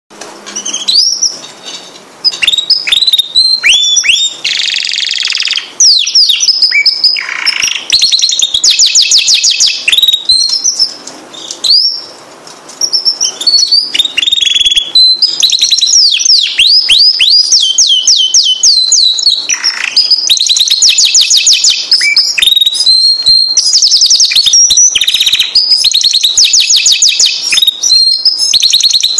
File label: sound jibar canary